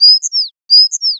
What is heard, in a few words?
Sparrow